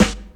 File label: 90s clear hip hop snare g key; snare drum samples